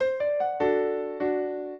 trio, piano; Mozart

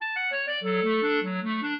clarinet; Mozart

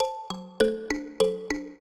trio, mbira; Mozart

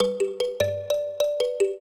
trio, mbira; Mozart

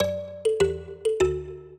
minuet, mbira; Mozart

